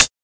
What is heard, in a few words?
thin hat one shot c sharp key; one shots; hat samples